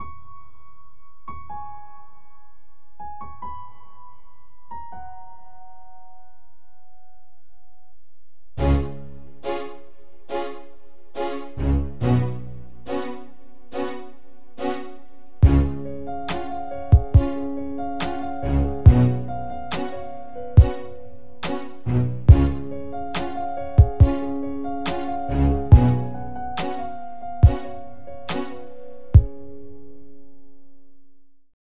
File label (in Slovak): Prechod hudba k videospotu